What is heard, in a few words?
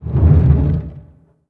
Door Close; MALL